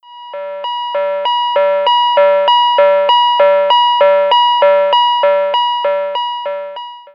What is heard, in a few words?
Fake Japan Siren